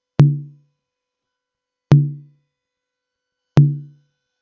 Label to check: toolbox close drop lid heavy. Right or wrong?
Wrong